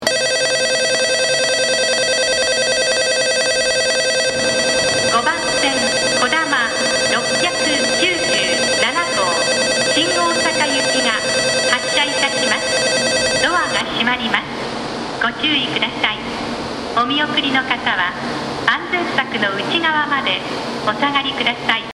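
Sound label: Mishima departure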